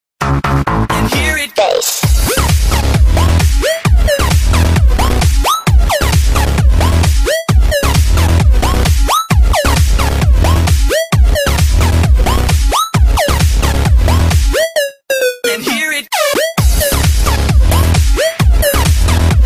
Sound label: electro, ringtones, electronic